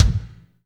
BD, DR BD; Best Service Gigapack 2

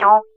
GUI MULT; EastWest; Dance Mega Hip Hop Electro

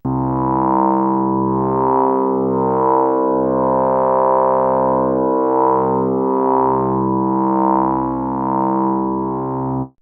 triangle mod, drones & pads

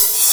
HH SR16; 90 s Sample CDs; Best Service Dance Mega Drums

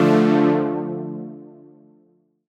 Dm Junoesque, Chords; Electronisounds

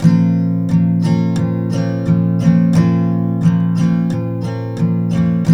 TK MIN B, Guitars; Spectrasonics; Hans Zimmer Guitars VOL-1

